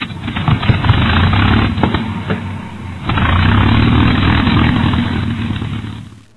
p51d startup; fgdata

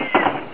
gear lck; fgdata

